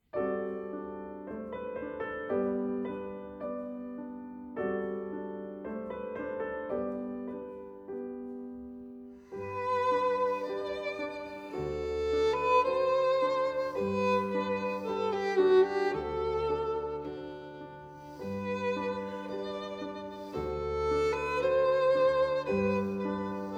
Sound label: Berceuse Viola